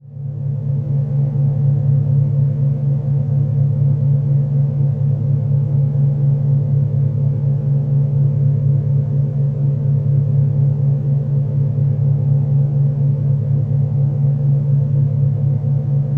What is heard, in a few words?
noise; cub3d